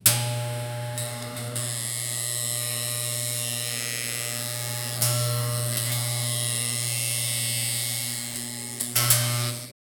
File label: electric